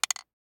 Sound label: DICE on Wooden Tray, Throw and Roll, Mini, 1 One Die; Samples; ArticulatedSounds; DICE Sfx Party (Extended)